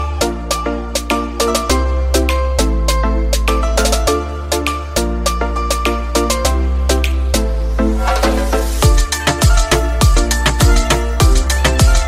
music sweet ringtone, bollywood ringtones

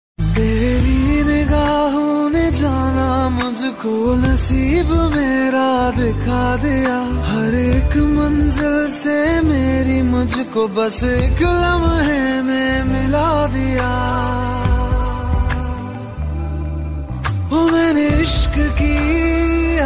bollywood romantic ringtone

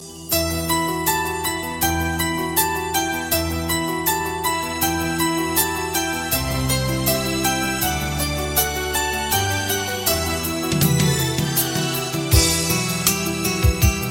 softly ringtone; iphone ringtones

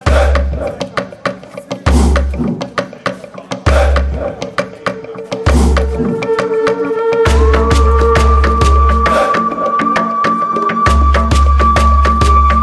tribal dancers ringtone

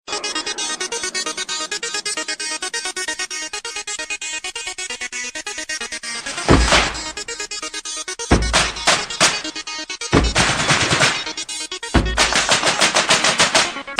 instrumental ringtone

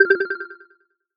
berylli ringtone, galaxy sms

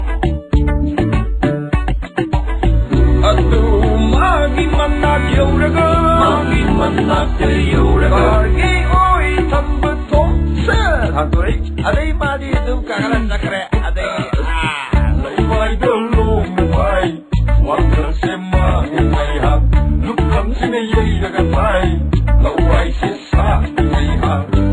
cartoon manipuri song ringtone

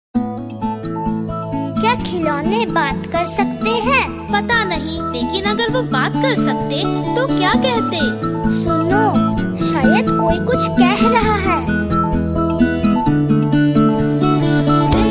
cartoon music ringtone

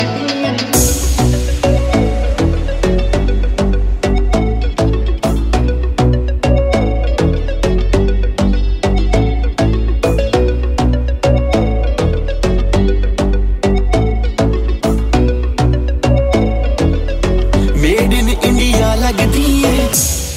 sad remix music ringtone, bollywood

